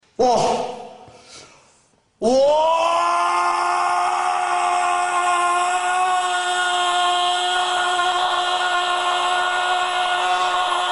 aaaaaaaaaaaaaahhhh porra

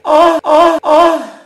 gemidane triplo

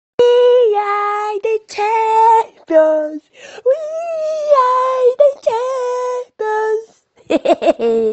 cantando

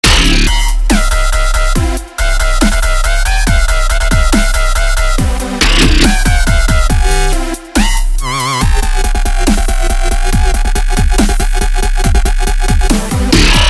musica de fundo da animacao

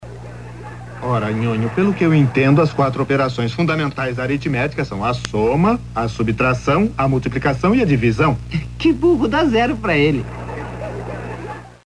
assobio

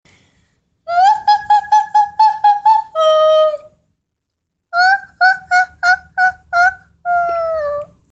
falsete

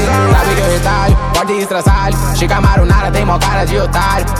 rap

